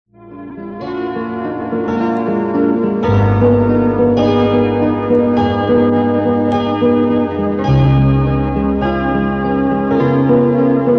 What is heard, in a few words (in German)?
natur; originalfilmton